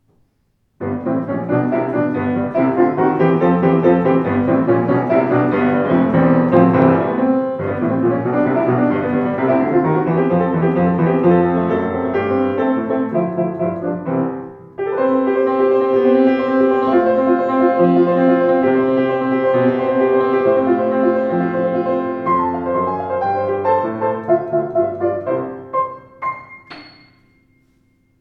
Foerster Rag, Klavier; 110 august foerster schwarz

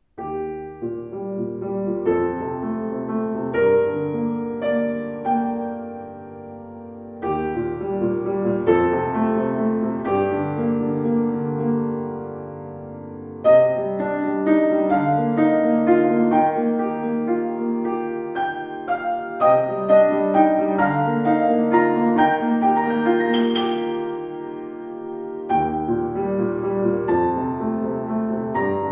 Klang

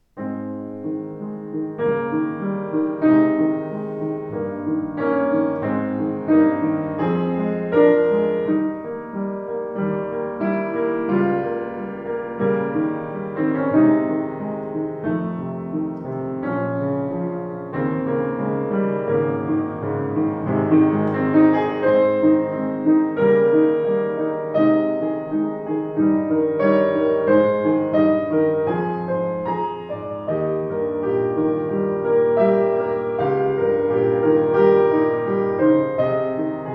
Schimmel Kirsche Beeth, Klavier; 117 schimmel kirschbaum

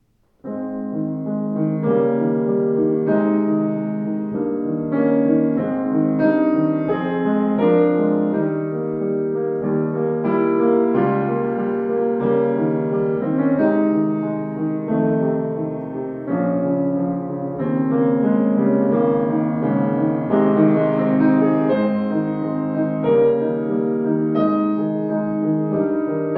Bechstein Studio, klavier; 115 bechstein studio eiche

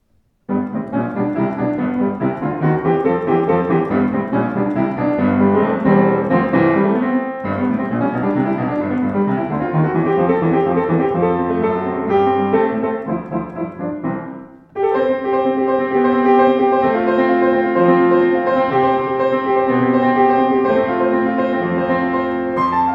Sauter Pure Basic, Klavier

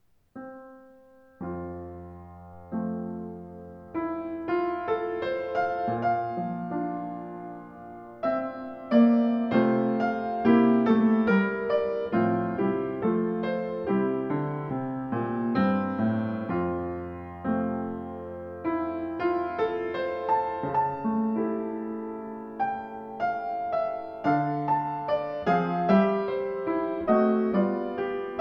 Sauter, klavier

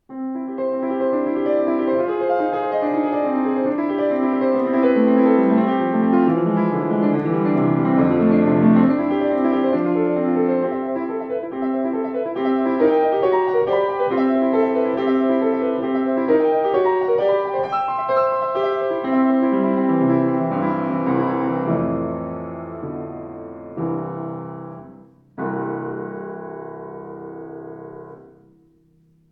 Grotrian Debussy, Klavier; 116 grotrian steinweg carat schwarz

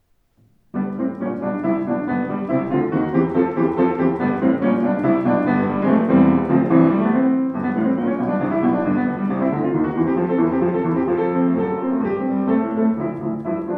Feurich Rag, Klavier

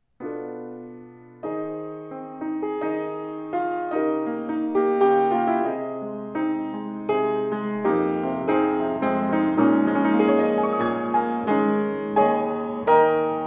Steinway Z, Klavier

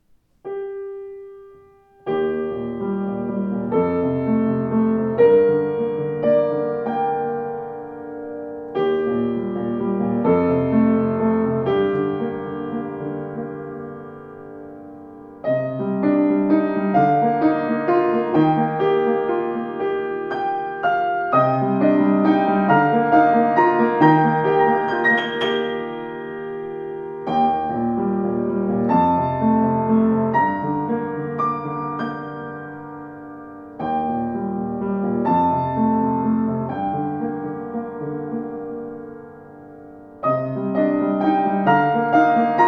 Grotrian Klang; 116 grotrian steinweg carat schwarz

